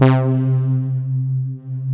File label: SYNTH X LD, TECHNO; Pro-Rec - Synthsonic; Z1 TECHNO